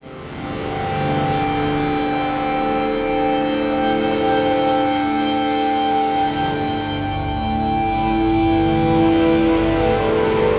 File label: Elektro Mass, Elektrik Drone, Sky Church; Sonic Foundry (Sony Creative Software) - Friendly Fire; DroneLoop